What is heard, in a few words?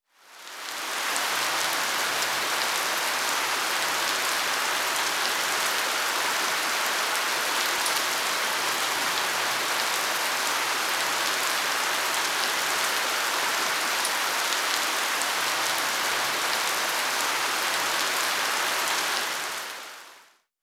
rain